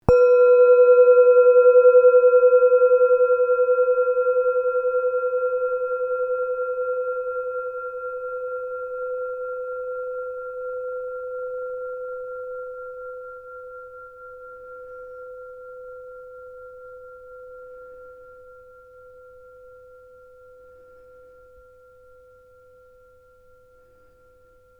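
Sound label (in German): klangschale sonne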